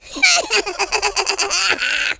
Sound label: laugh